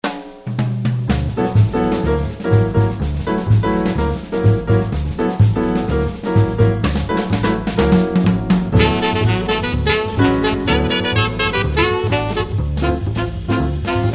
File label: Samba Dis Samba Dat